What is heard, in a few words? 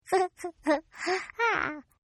Baby Laugh; Laughter; Partners In Rhyme